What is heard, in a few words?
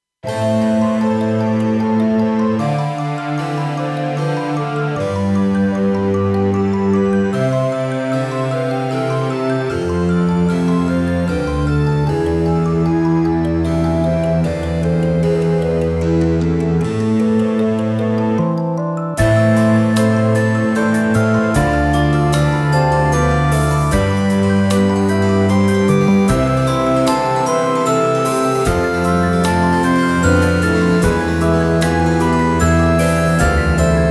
Menuett